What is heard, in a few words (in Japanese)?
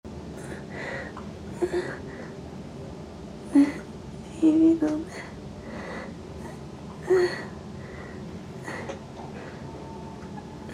耳舐め